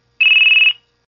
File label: Loud Beep Once; V710 Ringer